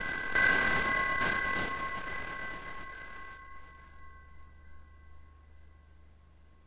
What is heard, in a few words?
Fuzzy Bell; Vintage LoFi